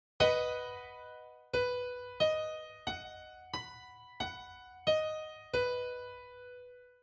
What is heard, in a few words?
B5 Grand Piano Triad